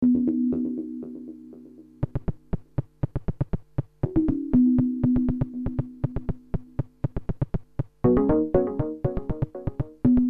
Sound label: es2 bells